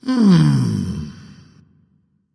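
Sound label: nonverbal content; smite; voicepack; ullr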